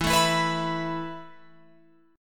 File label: E Suspended 4th E, down, Guitar, Open F